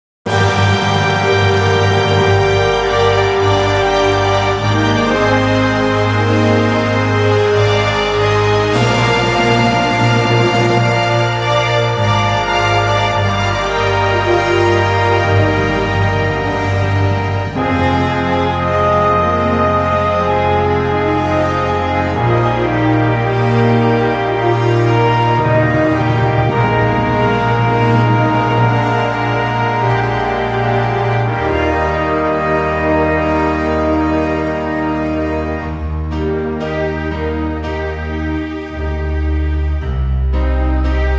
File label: Edirol Orchestral; Compumusic